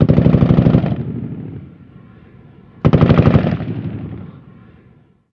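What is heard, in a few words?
gun us assault medium; ModernWarfare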